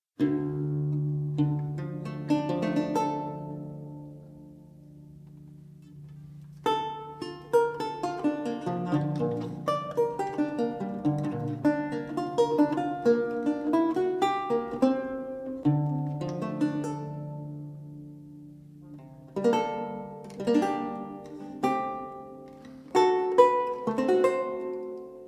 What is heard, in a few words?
Toccata